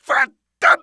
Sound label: hit, pain; mohaa